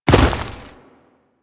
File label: Step Ice, Footsteps; Warframe; Grineer